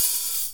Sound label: ALEMBIC BASS; OMI - Universe of Sounds